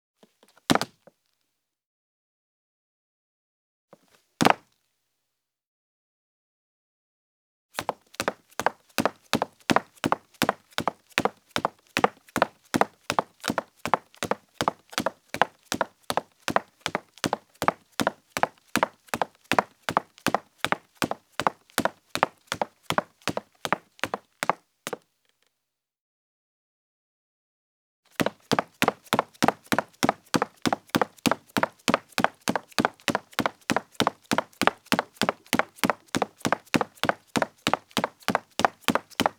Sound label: Footsteps, Foley; Sound Ideas 6017